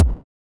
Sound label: ele Drum